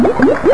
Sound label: bubbling, cartoon sfx